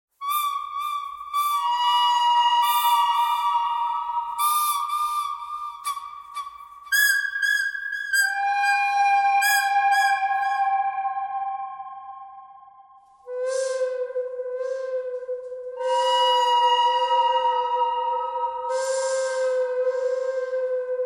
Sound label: bird canyon thread harmonic; birds